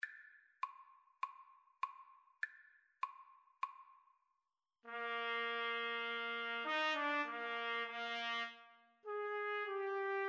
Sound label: trumpet duet